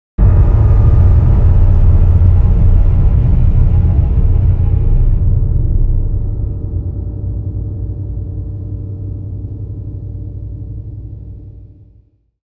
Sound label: Orchestra Hit